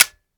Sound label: fire dryfire; gmod; viper